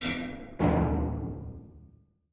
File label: Movement Metal Tank; The Looker